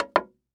Foley Footstep High Heels Single Step On Wood Boardwalk; Foley Footsteps